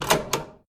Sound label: lever; PureBDcraft; minecraft